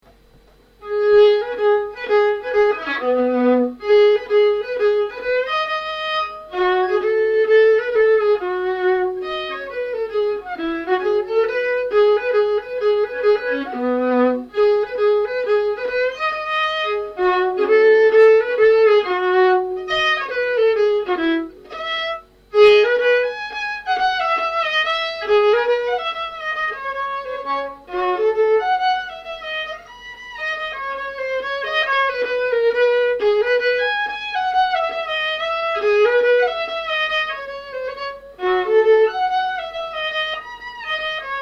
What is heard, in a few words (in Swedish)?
Gammelhambo solo